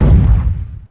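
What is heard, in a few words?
medium explosion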